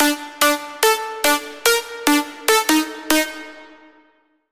Pluck Sparkle; Dynamite; Routed Sound; Sylenth1 Expansion; Chaos Bringer Vol.1

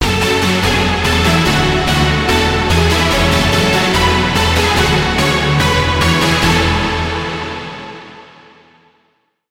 Key; Circuit Realm; Routed Sound; Vital Expansion - Reality Maker Vol.1

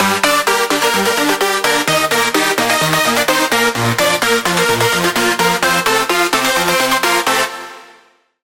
Lead, Sylenth1; Routed Sound; Sylenth1 Expansion - Star Scavenger Vol.1

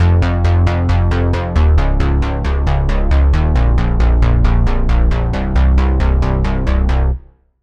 Bass, Sylenth1; Routed Sound; Sylenth1 Expansion - Reality Maker Vol.1

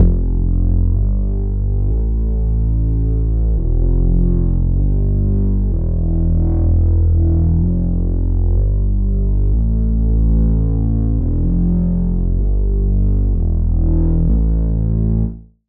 Bass; Deep Whisperer; Routed Sound; Serum 2 Expansion - Flame Summoner Vol.1

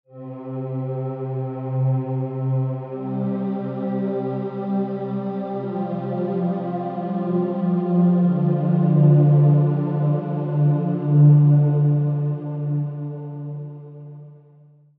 Pad; End Light; Routed Sound; Spire Expansion - Noble Gangster Vol.1

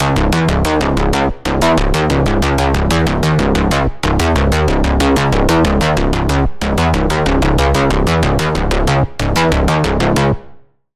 Bass, Sylenth1; Routed Sound; Sylenth1 Expansion - Doom Carpenter Vol.1

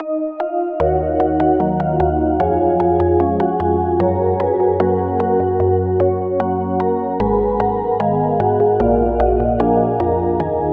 Key

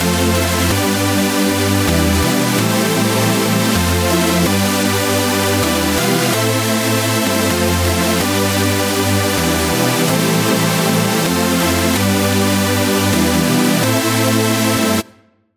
Chord

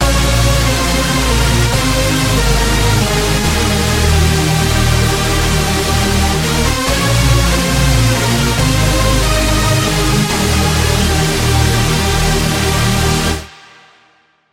Chord; Routed Sound; Spire Expansion - Flame Summoner Vol.1

